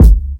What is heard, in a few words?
Kick